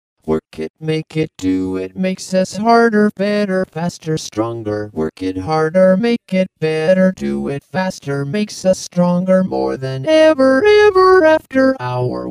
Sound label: Classic Vocoder Instrument Input